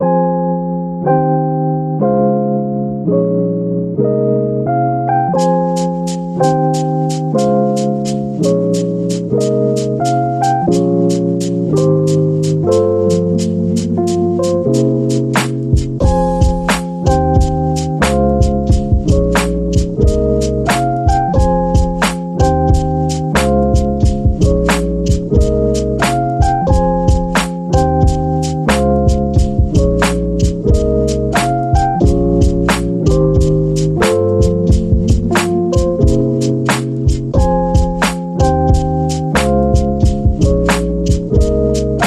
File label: Lofi Chill Sleep, Rhythm